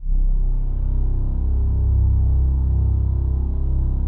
PAD, DIGI-PAD

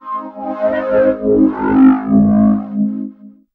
SE OVERD., Overdrivin'

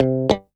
GTR, FUNK; Hip-Hop & R&B; USB Soundscan vol.47; AKAI

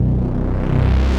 C Bass, DnB One Shots

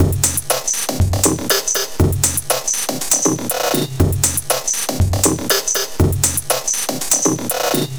Filter Percussion Beat; Sonic Foundry (Sony Creative Software); Myths of Technology; Composite Beats

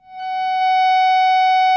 GTR, GUITAR FX

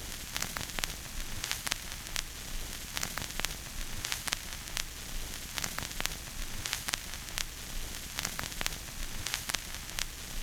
Vinyl FX; Sonic Foundry (Sony Creative Software); Cinematic Funk Urban Tone Poems

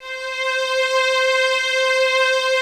violas C 5, String Ensembles; Miroslav Vitous; VLS 1+2

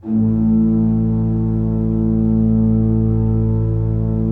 A1, Cathedral Organ; ILIO; Propeller Islands

